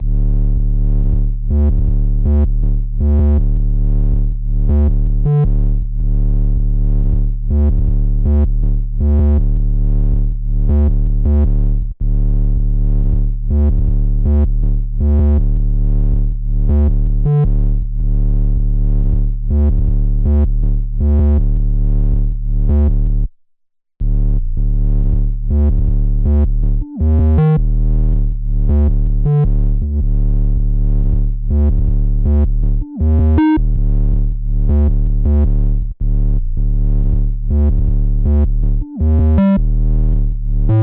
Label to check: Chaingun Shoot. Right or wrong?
Wrong